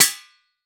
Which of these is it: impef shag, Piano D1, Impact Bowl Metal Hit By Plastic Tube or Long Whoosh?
Impact Bowl Metal Hit By Plastic Tube